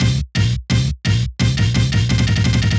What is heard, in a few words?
Amen Fill D, breaks